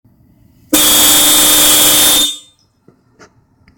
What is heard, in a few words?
subi horn new